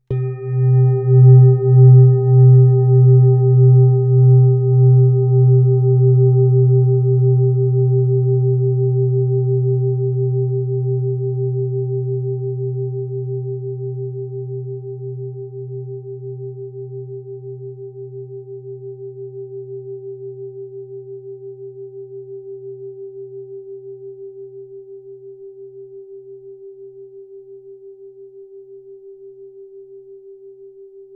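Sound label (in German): klangschale sonne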